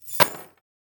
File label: chain link drop